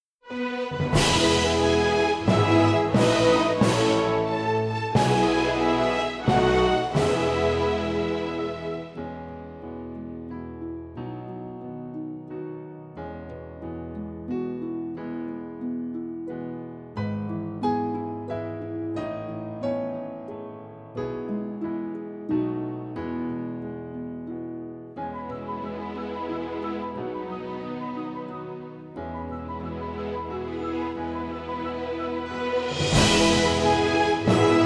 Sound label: Melody